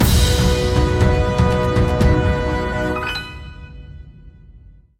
orchestral

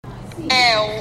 hahahah

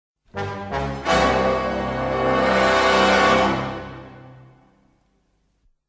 dramatic impact, production music; ren and stimpy

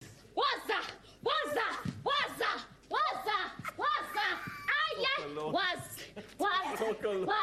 singing; south african idol

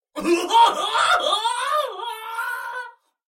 funny laugh

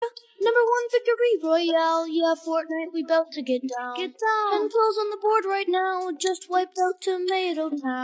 acapella

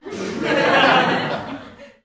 sitcom crowd laughing

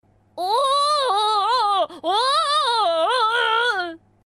oooooo

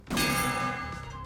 blade ball kill

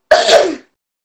cough

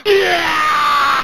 uaaaaaaa

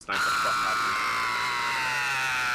scream

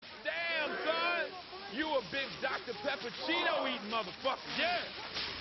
cheeto eating

